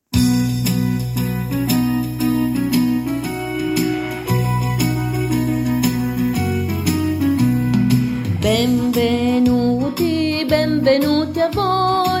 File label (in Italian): Benvenuti canto